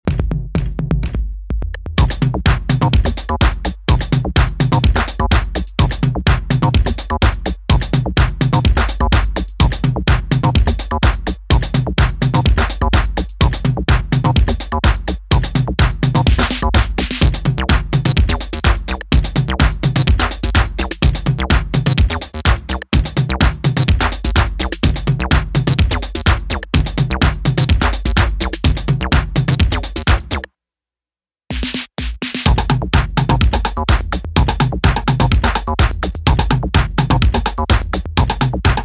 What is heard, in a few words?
Instrumental